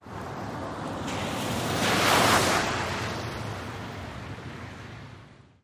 driveby puddle, Cars pass by, one with a loud muffler, several through puddles.; Traffic, Ambience Intersection, Street Car Bys